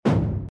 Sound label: explosion; Mindustry